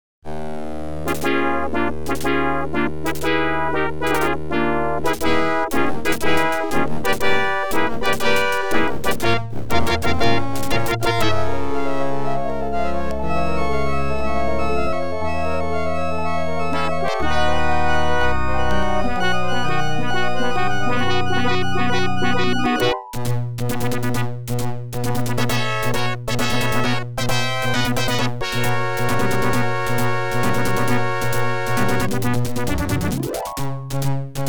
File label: OPL2; X WING